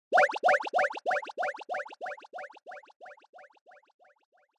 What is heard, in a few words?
Fantasize Long; シーン・妄想